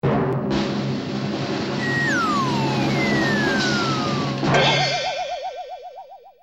drum roll for wobbling; Crash Bang Boom

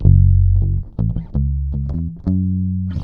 bass; Phonky

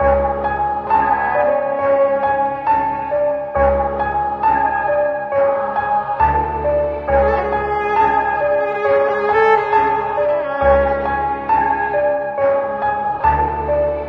piano and strings, Sad Loops

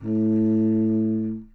contra bassoon; Notes; Winds

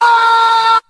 "oh" scream; ooh ahh

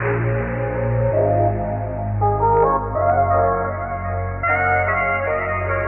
keys, phonky loops

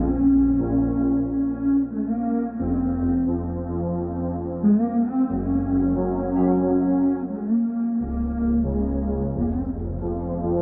keys, Loops, Sad

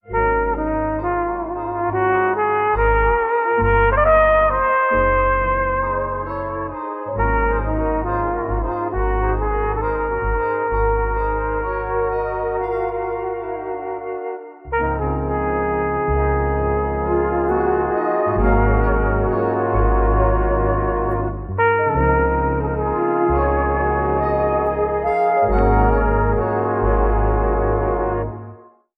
horns, jazz loops

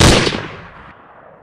gun shot; Crash Bang Boom

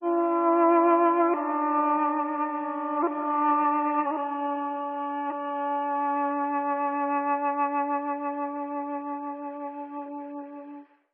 flute, Sad

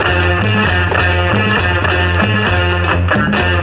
guitar; Loops; Phonky

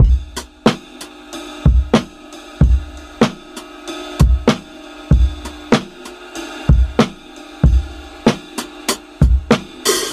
Drum loops Boombap

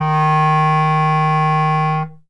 clarinet; Winds

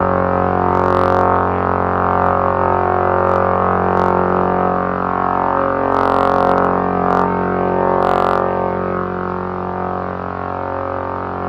helicopter, Synths; Notes

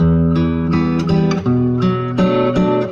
guitar driven

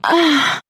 "uh" orgasm; ooh ahh

